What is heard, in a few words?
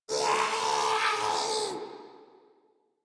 Attack Roar; en; Warframe; Grineer; Ghoul